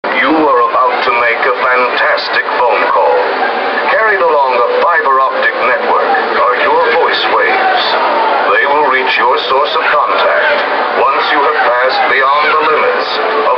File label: phonebooth